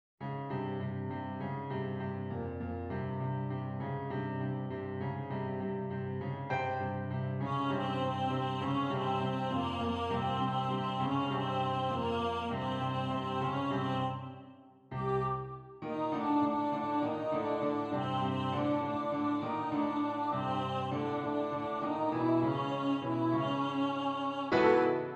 INST; Doc Holliday's Electronic Medicine Show

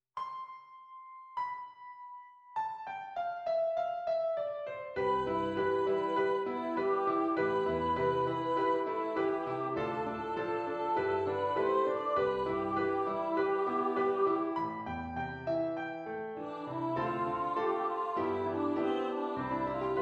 Sing Me A Reflection PIANO; Arthyr the Boy Who Would Be King a Musical

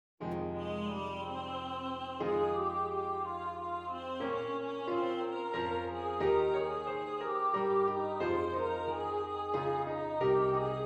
Morgana Prophecy PIANO; Arthyr the Boy Who Would Be King a Musical

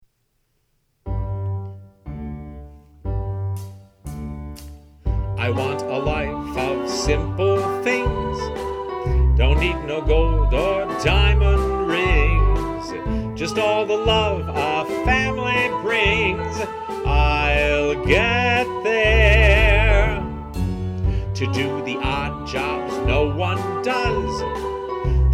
I'll Get There vocal

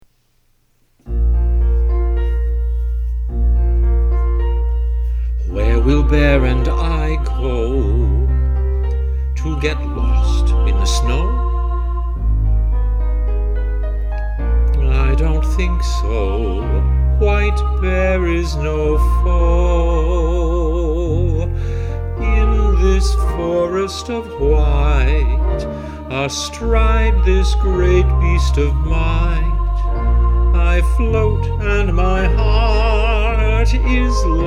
Somehow vocal

